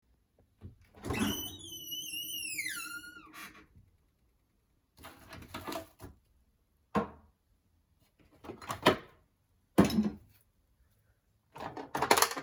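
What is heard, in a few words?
door creak cold; Включение, шум работающего холодильника и выключение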